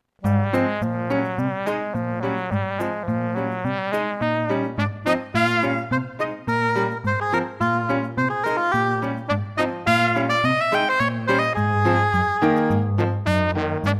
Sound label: Barn Dance; Egyptian